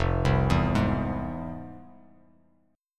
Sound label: F Minor Major 7th, FmM7 chord; FmM7 arpeggiated; JGuitar